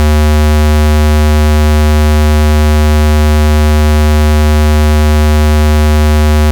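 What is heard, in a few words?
Oscillator Block Allmost 110hz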